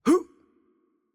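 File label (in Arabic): Attack Light, Arabic; ar AE; VO; bloom minigame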